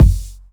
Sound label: mid heavy kick sample g key